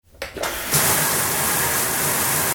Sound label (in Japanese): シャワー・お湯を出す音; 日常生活の効果音